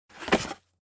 Cardboard Box Stacking

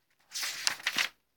Small Page

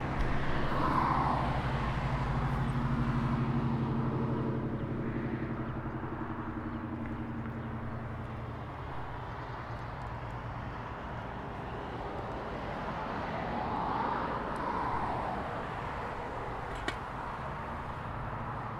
Traffic Sounds

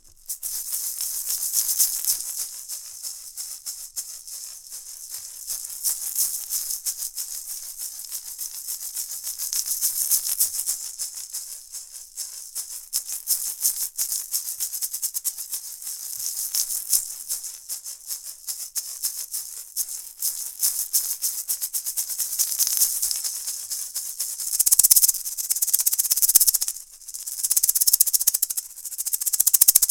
Shaker

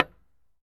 Hit Metallic Metal Pipe

